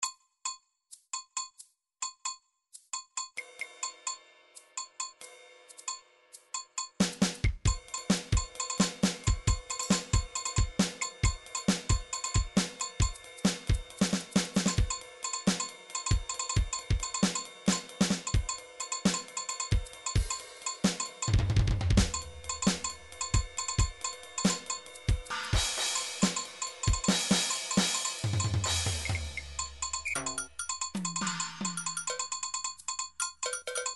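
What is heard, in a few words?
midi drums drums drum pattern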